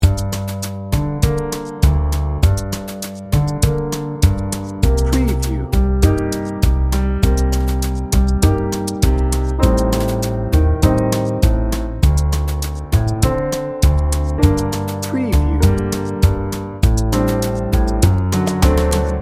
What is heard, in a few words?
rhumba romance loop